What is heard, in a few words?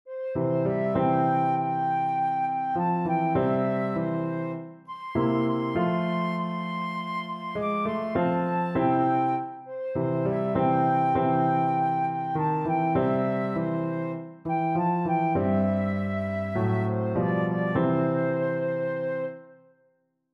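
penny whistle, tin whistle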